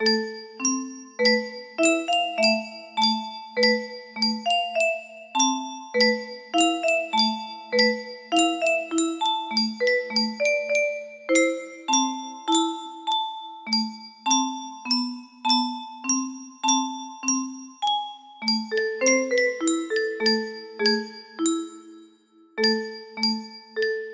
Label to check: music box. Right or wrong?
Right